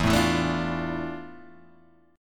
F Major 13th E, guitar chord

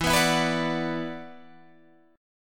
E 7th Suspended 2nd, Guitar, Drop D

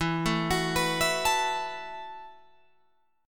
E Suspended 2nd Suspended 4th E, Guitar, Open F; arpeggiated; Esus2sus4; 16,16,18,18,19,16

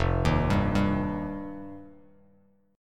F 7th Suspended 2nd, Bass Standard; F7sus2; arpeggiated; JGuitar

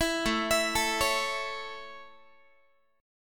A Major, Guitar; arpeggiated

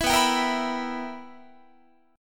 B Major 7th Suspended 4th Sharp 5th Guitar, chord; strummed

